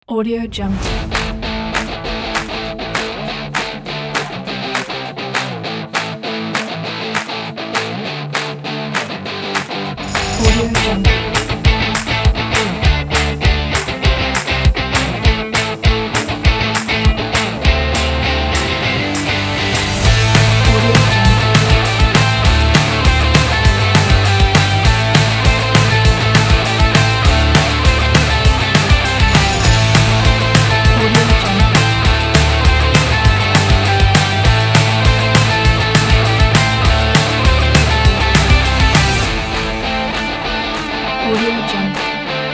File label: energetic indie rock